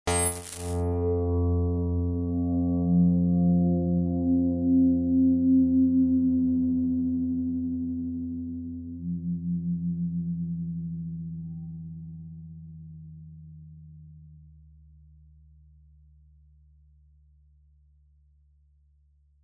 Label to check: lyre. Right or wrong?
Right